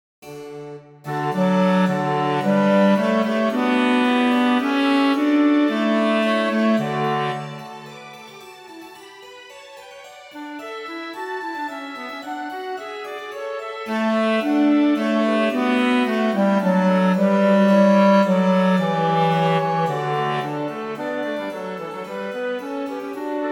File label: Let All The Angels Of God Worship Him, Bass